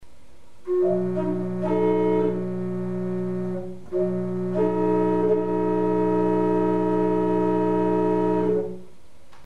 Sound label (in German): Mikrotonale Dissonanz; Mikrobuch